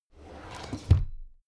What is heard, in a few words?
drawer cabinet crammed close regular halfway